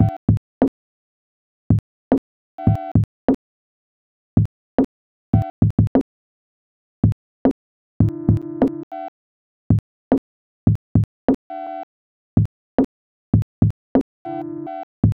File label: Sinewave